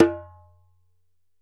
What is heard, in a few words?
ASHIKO, African Drum